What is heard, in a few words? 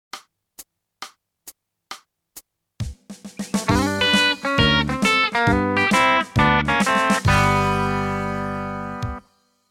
country